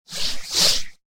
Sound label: Skiing Fast And Brief; Skiing And Snowboarding Sound Effects